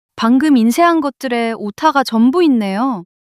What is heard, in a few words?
surprise